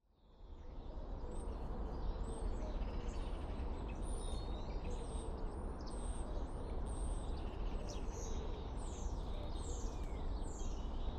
rural rush hour; wild soundscape

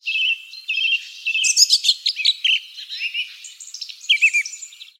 Song Thrush

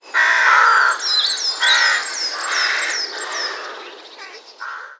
Crows

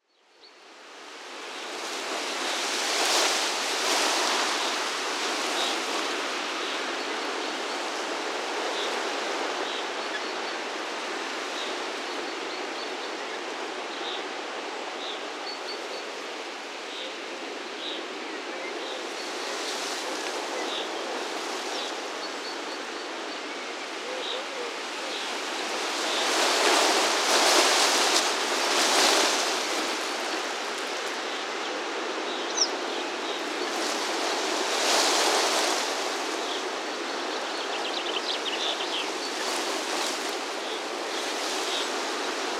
Sea Wind in Grass; wild soundscape

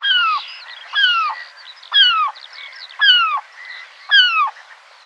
Common Tern

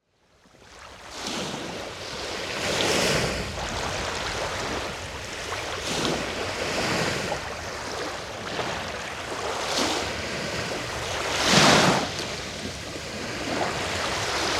High Tide Salt Marsh; wild soundscape

